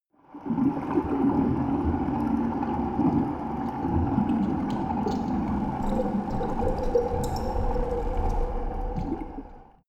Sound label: water fx